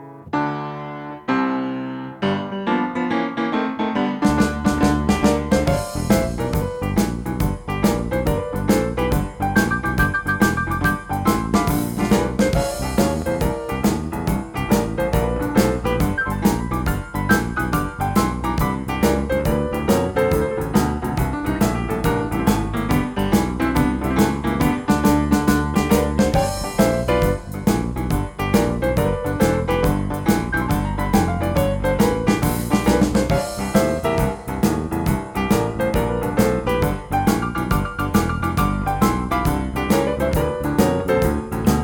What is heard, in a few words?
Slow Boogie Dance